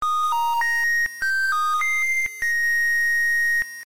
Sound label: flute